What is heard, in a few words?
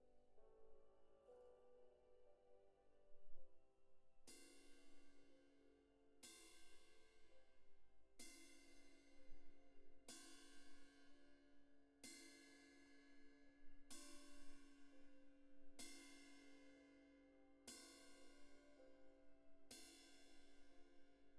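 cymbal close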